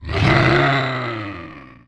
scrm; The Elder Scrolls III - Morrowind

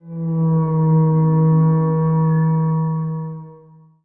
howl; The Elder Scrolls III - Morrowind

